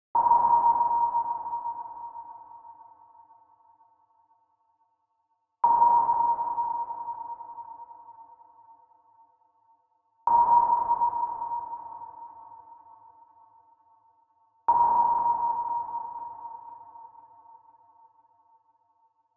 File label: Submarine